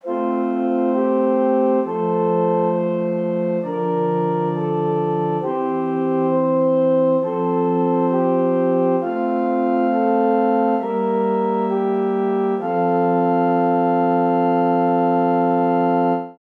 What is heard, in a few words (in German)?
Stimmiger Contrapunkt; Ronge